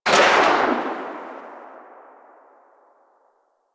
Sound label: Fire Tail, Weapons; Warframe; Braton